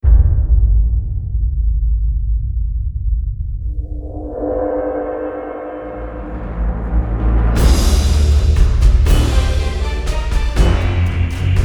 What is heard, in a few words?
Dragon Ride Roost; Zombie Stalingrad